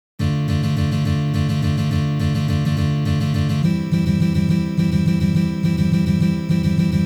boj na gitare

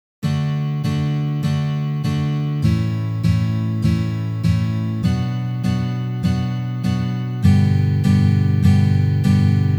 Uprazhneniya dlya igry akkorda Re mazhor na gitare

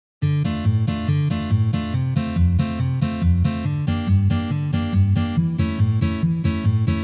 boj

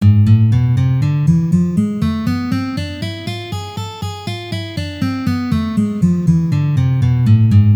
Garmonicheskaya gamma Lya minor 4 pozitsiya

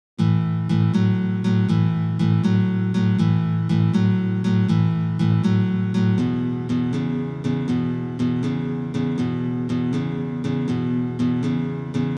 Ritmicheskij risunok SHaffl

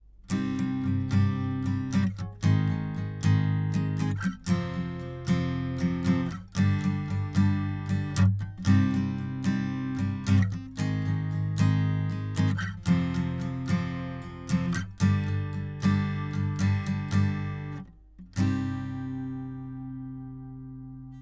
Akkord Cm uprazhnenie

